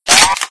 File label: sniper bolt; unreal2